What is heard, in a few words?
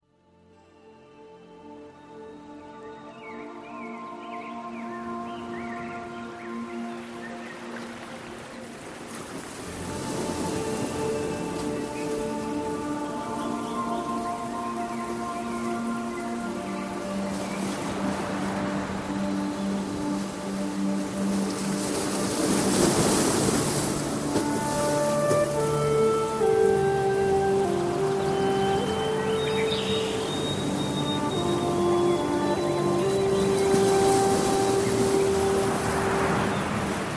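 subliminal intuition east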